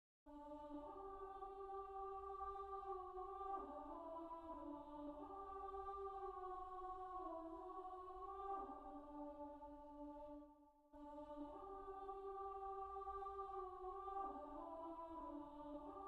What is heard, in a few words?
Siyahamba Alto